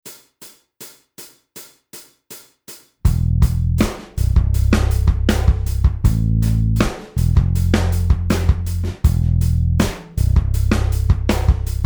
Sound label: backing track, guitar; guitar techniques